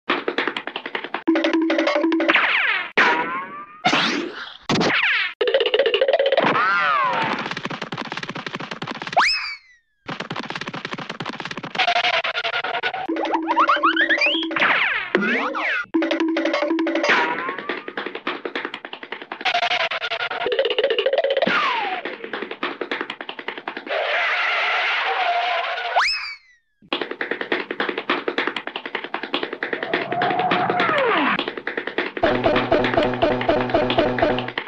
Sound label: Cartoon Running Sound